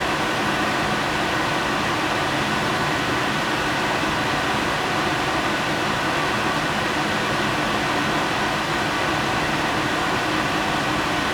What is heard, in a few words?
Industrial Gas Boiler room boiler stops; Ivo Vicic